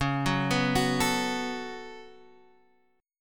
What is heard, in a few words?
C sharp 7th Suspended 4th Sharp 5th, C sharp, down